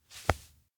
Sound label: touch hand; awayuki; anemoi